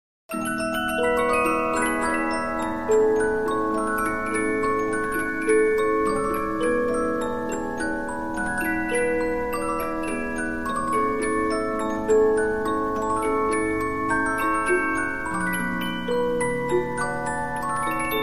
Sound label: Polyphon, 15inch disc musicbox